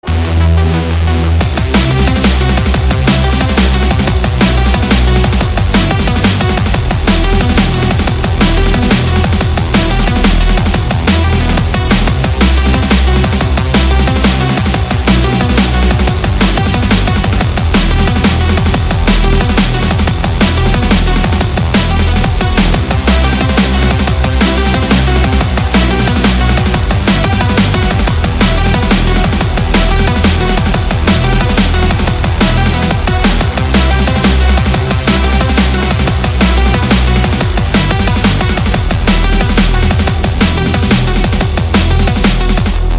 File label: win music